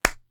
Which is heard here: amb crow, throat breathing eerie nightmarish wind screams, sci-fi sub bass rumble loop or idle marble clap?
idle marble clap